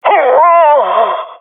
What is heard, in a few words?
death, jungle male epic; player vo; Counter Strike Model Pack; CS:GO